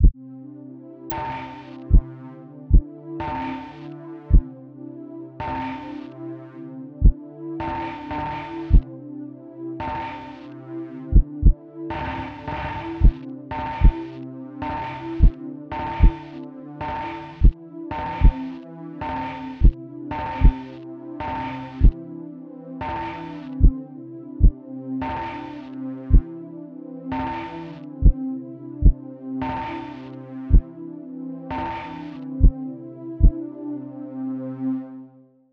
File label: Sinewave